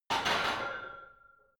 barbell heavy plates replace rack reverby, fitnessstudio